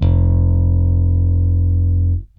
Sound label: BASS, ROCK POP; MAGIX